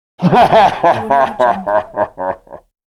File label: evil man laughing